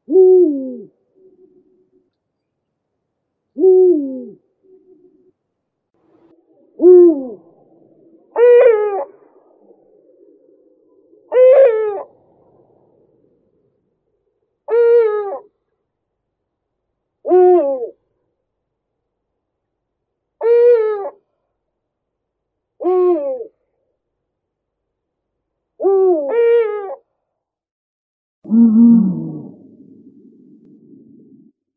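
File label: eagle-owl bubo bubo; filin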